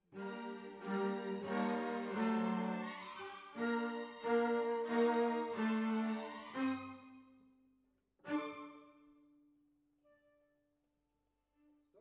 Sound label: Cellos